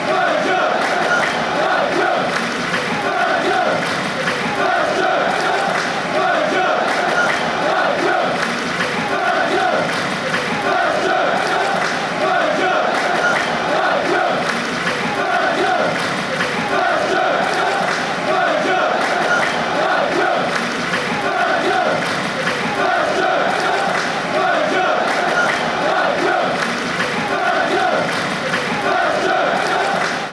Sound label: belgium belgium, fanchants